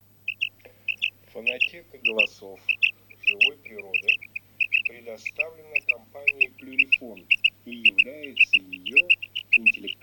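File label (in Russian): Перепел Quaglia, chip mini для манка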